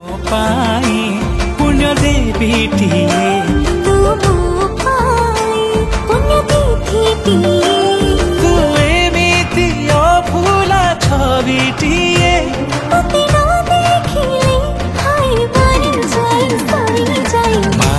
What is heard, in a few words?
Romantic Song